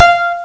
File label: piano